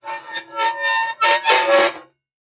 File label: Metal Scrape Squeaky Scrape; foley